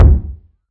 Fracture Kick; UNUSUAL KITS and FX; ModeAudio

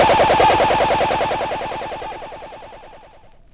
Electro FX; Ueb HE Electro FX

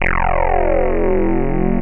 narrow sweep, Synth

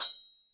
chappa A4, Chappa and Chanchiki Cymbals; ETHNIC and WORLD PERCUSSION

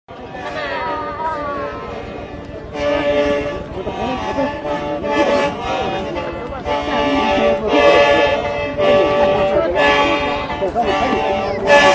lusheng and crowd, Miao Love Songs; VOCALS and SPOKEN WORD

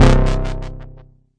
Arturia MiniBrute syn

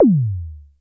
909 bass, drum machines

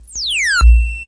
foldover frequencies; UNUSUAL KITS and FX; Atomhard Electro FX

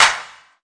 clap, drums single hits; Claps

